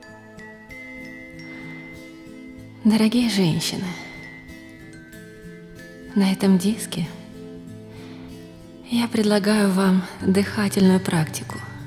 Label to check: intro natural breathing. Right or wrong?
Right